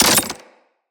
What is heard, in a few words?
Melee Impact Glass